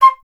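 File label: flute C; Miroslav Vitous; Solo Instruments VOL-2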